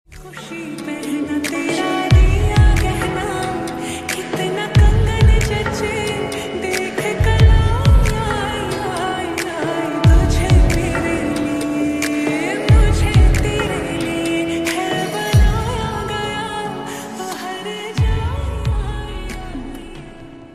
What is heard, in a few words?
slowed reverb